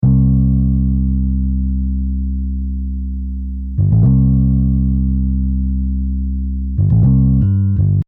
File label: bass